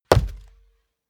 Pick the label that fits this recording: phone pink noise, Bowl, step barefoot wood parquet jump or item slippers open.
step barefoot wood parquet jump